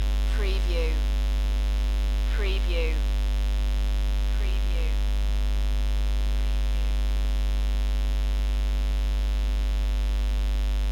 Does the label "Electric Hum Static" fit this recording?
Yes